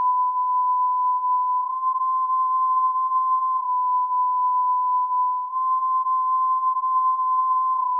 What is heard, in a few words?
1000Hz vs 1030Hz